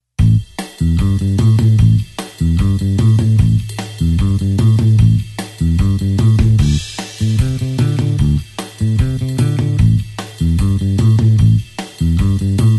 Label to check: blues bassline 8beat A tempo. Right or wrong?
Right